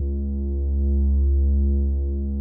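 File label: C#1 PR6 ORGAN, SYNTH VIBRA; AKAI S-Series CD-ROM Sound Library VOL-7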